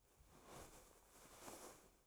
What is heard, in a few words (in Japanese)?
布ズレ